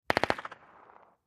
MP5 9 mm Machine Gun Burst From Distant Point of View, X4